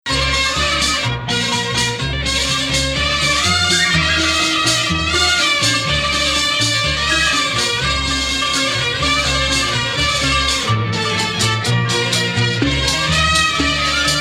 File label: Rast Octave, maqam